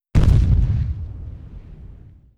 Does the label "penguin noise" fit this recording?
No